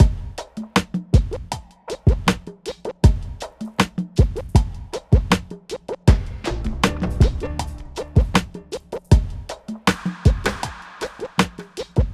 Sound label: Drums, Hip Hop